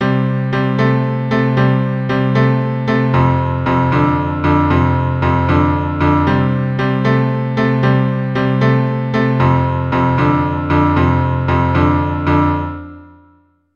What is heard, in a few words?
Boogie Woogie bass, keyboard